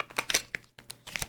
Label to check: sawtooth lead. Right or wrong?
Wrong